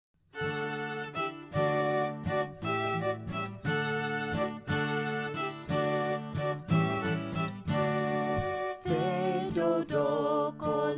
vocal; Fais Dodo

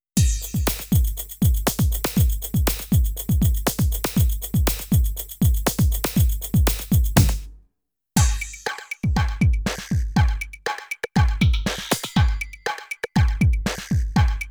multiplication rap instrumental; 3 times tables

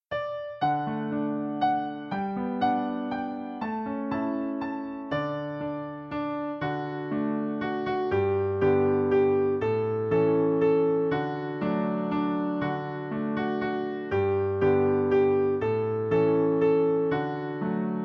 git along little doggies instr

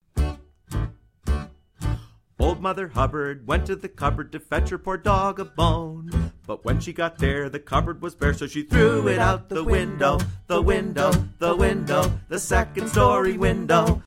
throw it out the window vocal; nursery rhymes

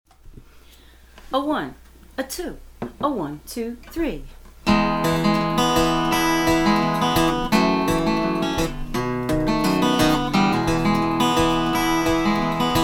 muffin man vocal; the muffin man song

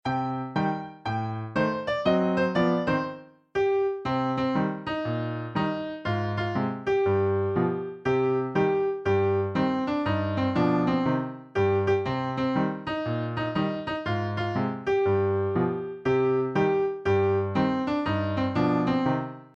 the erie canal instr

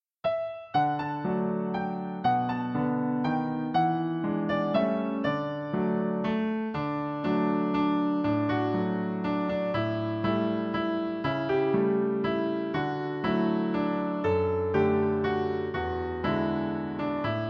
instr; Cockles and Mussels; folk

